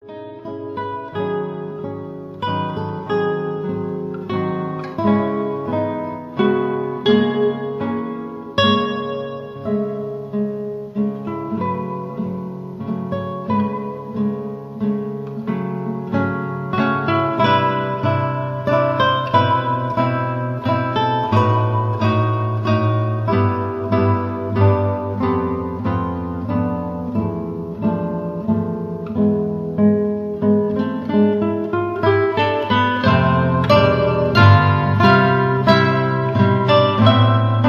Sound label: Elegy, 4 Guitars; for the victims of Katrina